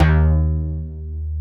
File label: SLOPPY BASS; BASS SOUNDS